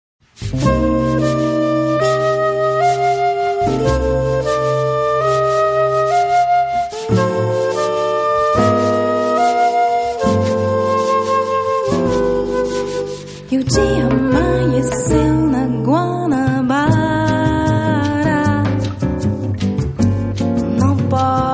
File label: samba tzigane